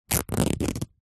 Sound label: foley clothes cotton underpants rip tear